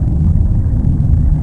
water surface; Quantum Redshift

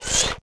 menu fly up; Tony Hawk's Project 8; XBOX

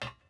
metal run, footsteps

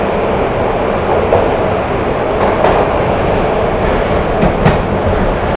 Subway Train Close loop; ambient

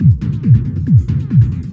empty bar; Star Wars Knights Of The Old Republic I; XBOX

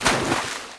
water hit

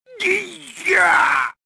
choke; Star Wars Jedi Knight II Jedi Outcast; XBOX; bespincop

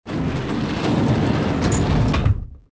air door; Soldier Of Fortune II Double Helix; XBOX

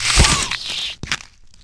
rat death squish; animal; Tony Hawk's Project 8; XBOX

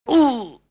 man hit, duel; Pirates Of The Caribbean

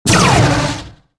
alt fire; demp2

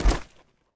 bodyfall, foley

